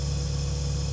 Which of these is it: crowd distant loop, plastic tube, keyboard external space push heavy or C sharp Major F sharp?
plastic tube